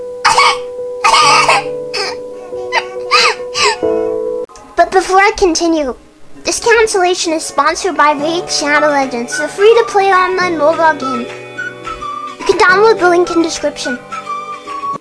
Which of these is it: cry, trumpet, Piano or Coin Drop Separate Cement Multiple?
cry